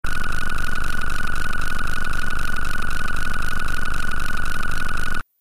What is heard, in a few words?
Bleeps Rapid Cyclin